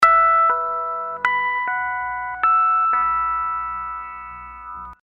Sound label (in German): dreckige hunde flageolett